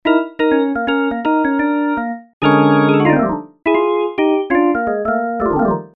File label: cs hammond; yamaha cs1x cs2x